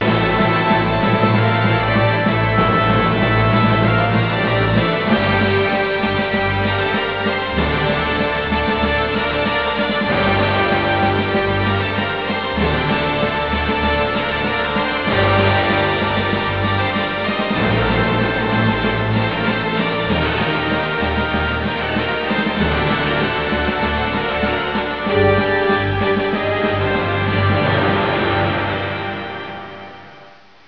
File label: emotional